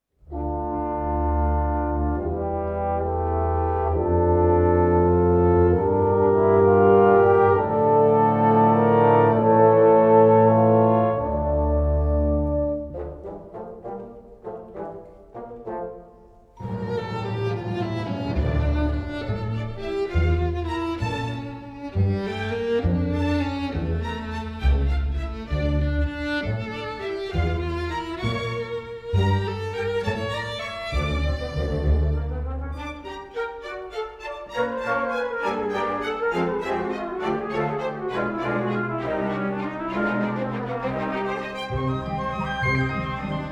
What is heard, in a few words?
Concerto For Trombone And Violin; Symphonia Original Soundtrack 2024